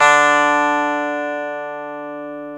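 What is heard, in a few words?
E 12 String guitar; Club-50 - Foundations Roland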